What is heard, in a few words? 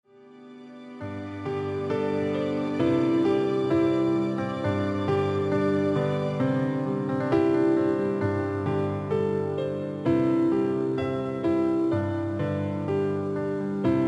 minus one